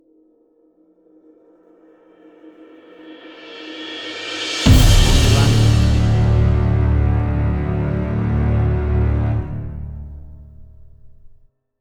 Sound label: Battle To The End Short Stinger